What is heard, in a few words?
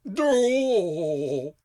Fung brawler groan